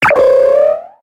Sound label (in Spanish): Grito; Sawk